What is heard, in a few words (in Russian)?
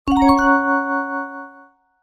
volshebnyj perezvon